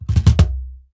MIXED DRUMS, World Instruments; Best Service ProSamples vol.52 - World Instruments 2; AKAI

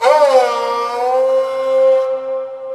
sarangi riffs

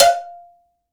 COWBELL, GM PERC KIT; AKAI S-Series CD-ROM Sound Library VOL-5

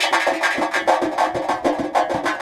Echo Chuff Down Up, Synthetic Pulses

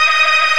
Organ, MortStrg+WurlVox

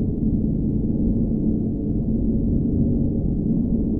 Noise Loop, sparse soundscape

